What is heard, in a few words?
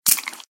foot wet; Interactions